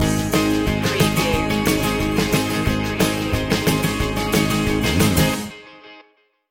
back home stinger

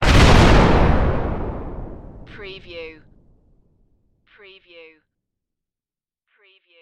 Explosion Heavy; Sound Effects Bible

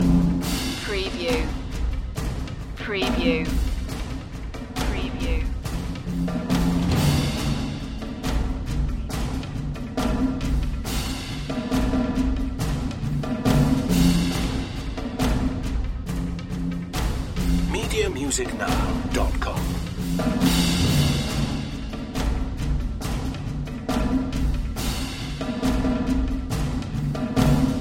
percussion loop

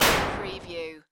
Retro Scifi Hit

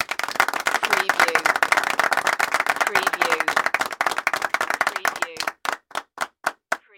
Crowd Clapping

